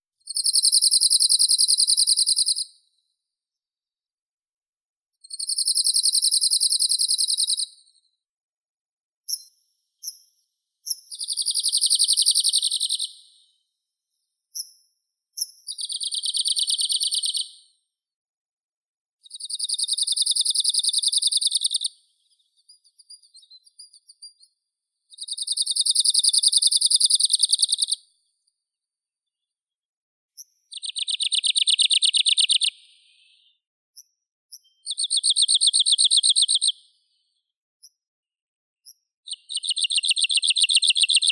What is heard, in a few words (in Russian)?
penochka talovka phylloscopus borealis; zvuki prirodi